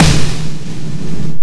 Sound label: Snare, F0; Guitar Rock Tour